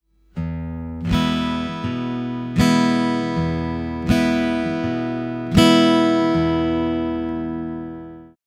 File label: E and A strum, guitar